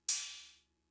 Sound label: Cymbal; Fricke MFB-522